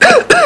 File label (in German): husten